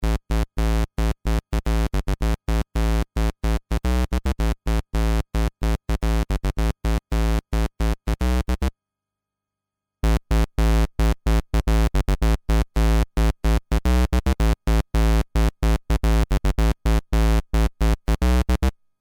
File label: Waveform Sub